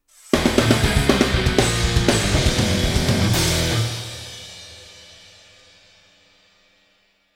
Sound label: Win or Lose; Fight of Animals; Android